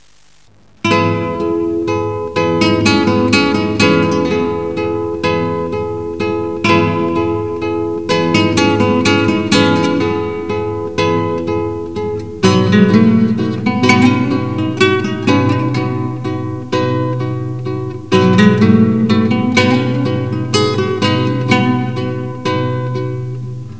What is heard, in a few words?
classical gtr; myth